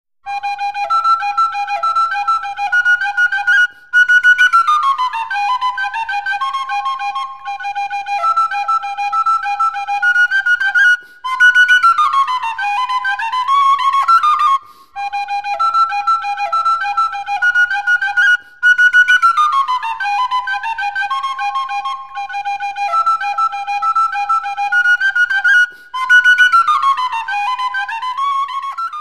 Historische Floetentoene Landler

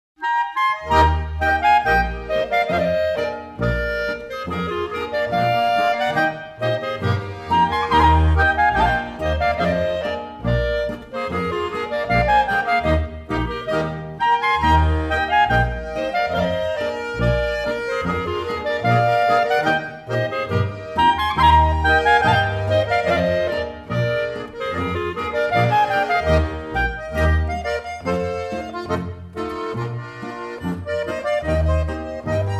Boarischer, volksmusik; Kreuth

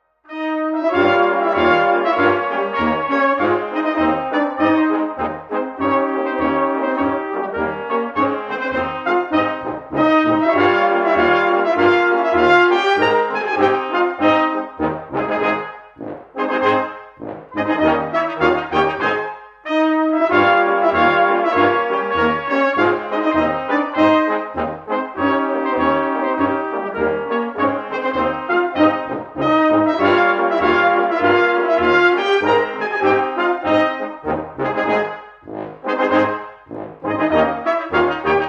Bum Bum Polka

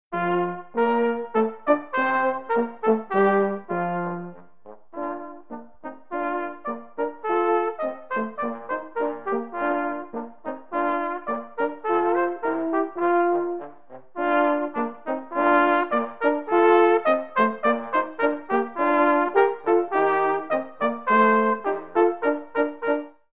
Polka

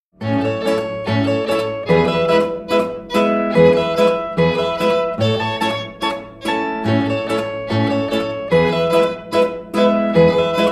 Oberbayerischer Schottischer

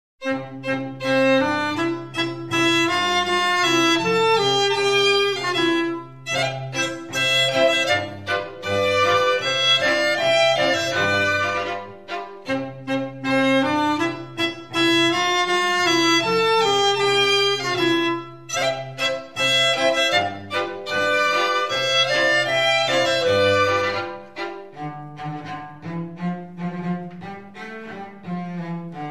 Aufzugsmusik

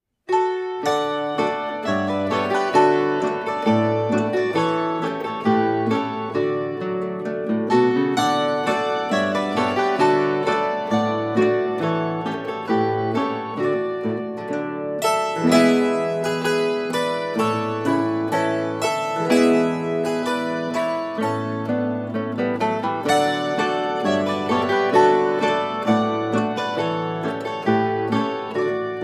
Spielmusik Religioese

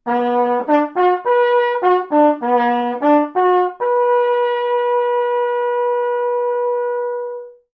Original Altbayerisches Postsignal

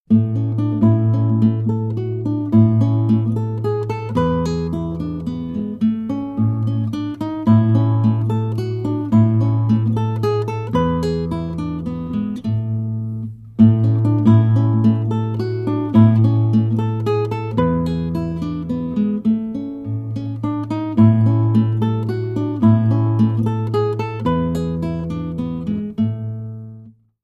Volksmusik Chiemgau Gitarrenlandler

